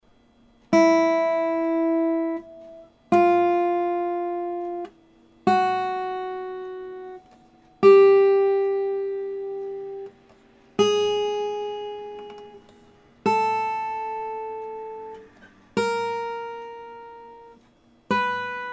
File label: yamaha after, guitar vibration